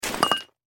drop

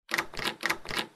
locked

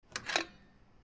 fuel pickup

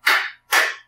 lift

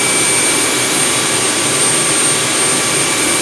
turbo, vehicles

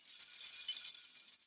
spike, Police

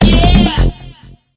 gamble win

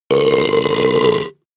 new engine

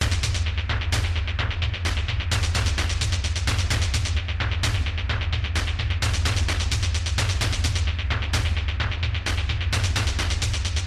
got fish drum

